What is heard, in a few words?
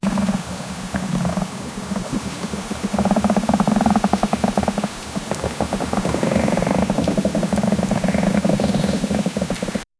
slow dragging sound